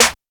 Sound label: raw snare single hit e key